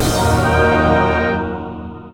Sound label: loot ultimate